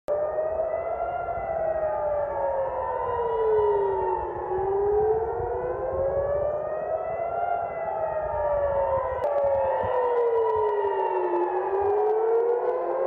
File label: alarm siren in Tehran; آژیر خطر جنگ; تهران